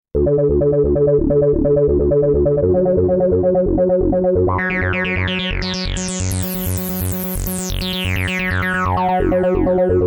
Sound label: Dance Xpanded Arps Gates